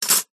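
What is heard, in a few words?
Silverware Drop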